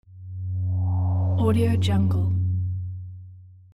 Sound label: electric slide; stereomarket